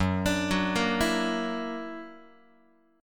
F sharp Augmented 7th F sharp Guitar; F#+7 arpeggiated; x,2,5,4,2,3

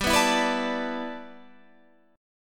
G 6th Add 9th G Guitar Standard, chord; G6add9 strummed

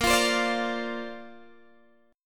A sharp Major Add 9th, Guitar, Open G

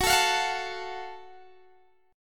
Gb Minor Major 7th Gb down Guitar DADGAD

